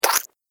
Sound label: Cartoon Slime Splat